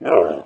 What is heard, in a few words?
damage; chepet